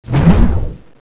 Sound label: wind wave